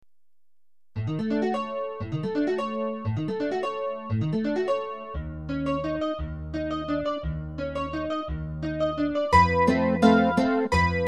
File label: Music box